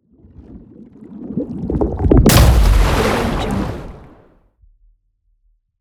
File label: water textured whoosh hit; dsgnstngr; soundmorph